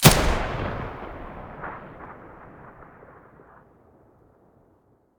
muzzle mono

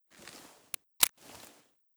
M320 draw, weapons

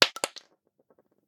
Shell 12ga wood; STALKER; IWP

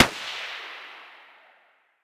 scar muzzle mono far

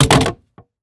pistol drop wood; STALKER; IWP

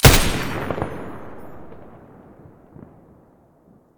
muzzle m249 stereo, weapons

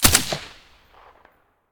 scar silencer mono

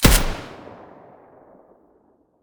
ash12 silencer mono, weapons; STALKER; IWP

